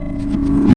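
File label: drums; alone in the dark 4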